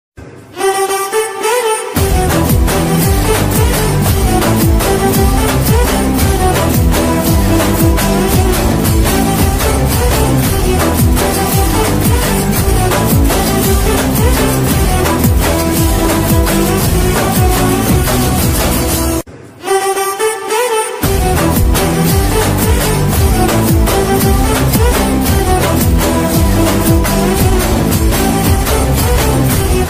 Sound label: movie bgm ringtone love ringtone south movies ringtone bgm; a aa; samantha ruth prabhu